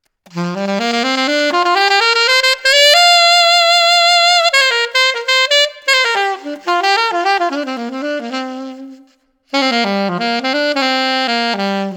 Alto Bluesy Mix Reverb, alto saxophone mouthpiece; js custom aura 7 alto saxophone mouthpiece

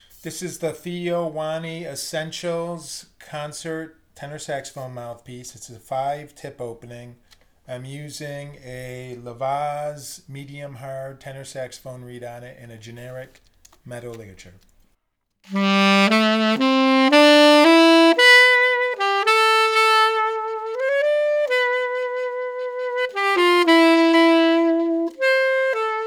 Concert, tenor saxophone

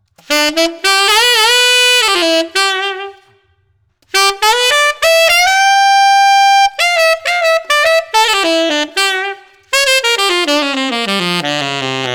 Altissimo Reverb, tenor sax; florida v tenor saxophone mouthpiece; one master

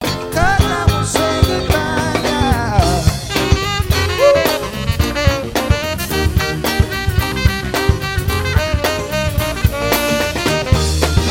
Sax solo; J7

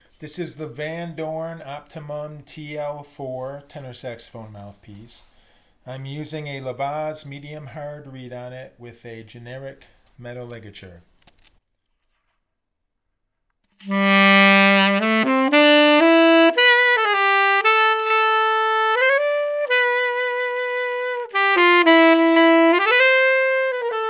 tenor saxophone; ellis music in vermont

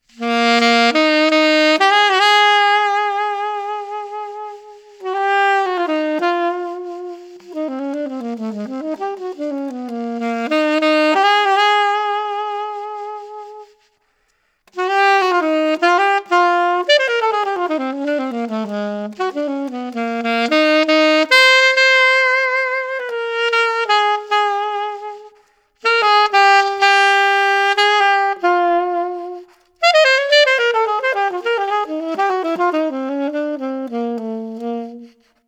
Alto Reverb, alto saxophone; js custom nova 6 alto saxophone mouthpiece

